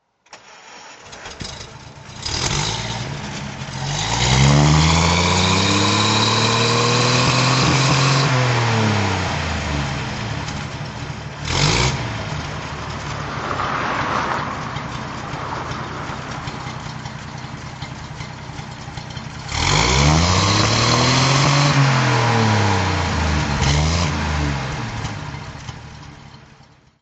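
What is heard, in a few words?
alvis silver eagle sg throttle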